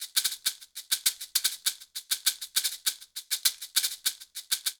Charanga Maracas 200bpm, Latin Percussion; Ableton; Factory Packs

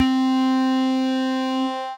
Glock Bell, synth keys; Wavetable; Majesty Glock Bell; Ableton; Synth Essentials

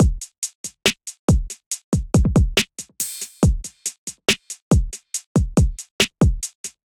MPE Kit 70 bpm, Trap Drums; MPE Vortex Kit; Trap Drums by Sound Oracle; Ableton; Factory Packs

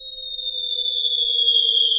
Whistling Bells, Dark Textures; Instruments; Ableton; Mood Reel

